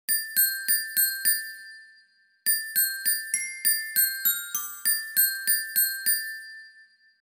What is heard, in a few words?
glock